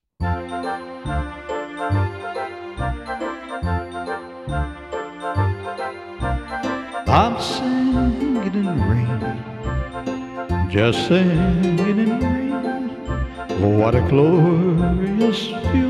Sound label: singing rain